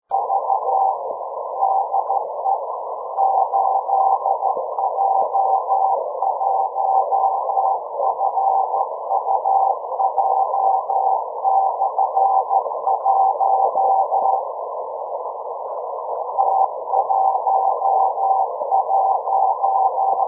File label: CW Beacon; Fujisan; FujisanPJ